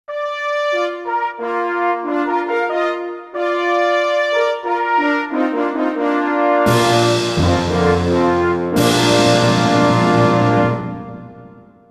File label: VS Battle Fanfare